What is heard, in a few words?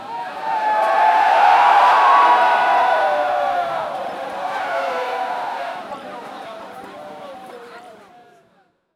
crowd panic fire emergenc; emergency